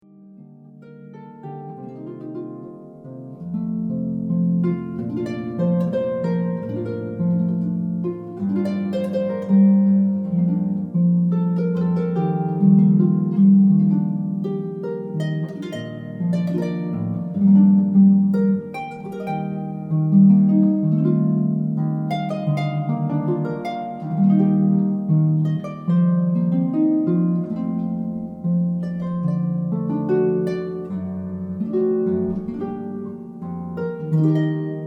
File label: oboe